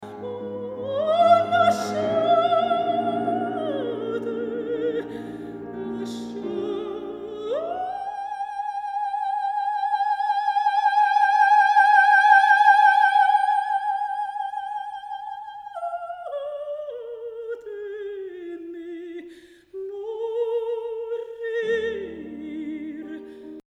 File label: Messa di Voce